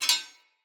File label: Split Sword Join; Weapons; Player; Swords; Starter